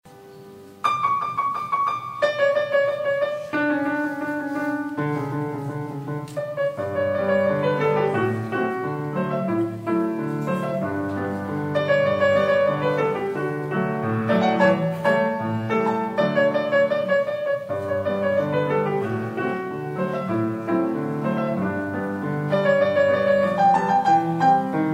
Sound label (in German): Klavier; Die Muhle im Schwarzwald